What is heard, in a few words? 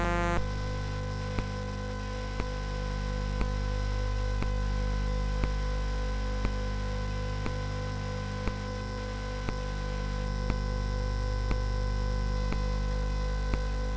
CSG8580R5; hvsc